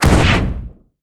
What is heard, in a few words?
ninja disappearing